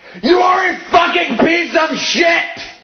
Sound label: YELLING; amnesia: the dark descent; madness archive; madness-ch03-demo; enemy; hater